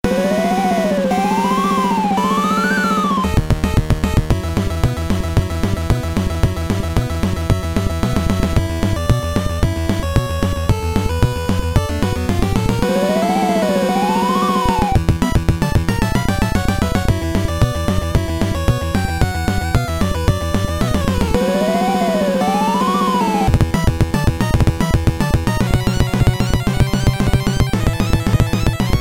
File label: battle; shredder